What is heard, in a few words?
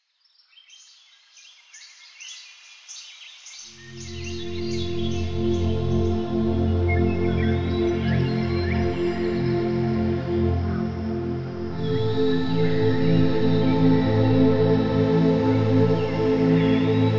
Music for Ketamin Session 30 Bpm With Birds